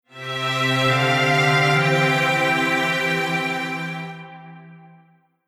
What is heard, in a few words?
Strings Poly Hamo